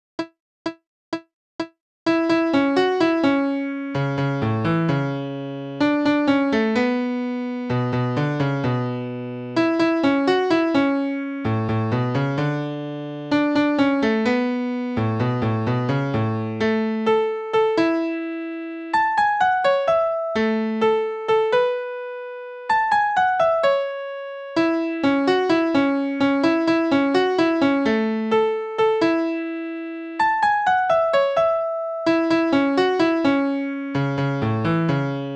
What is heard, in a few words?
melody